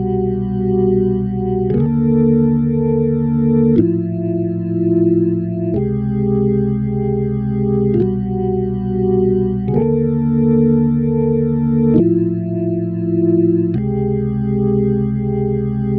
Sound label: Organ